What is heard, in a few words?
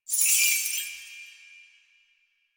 Naginata Whoosh Tonal; Weapons; Melee; Warframe; Koumei Naginata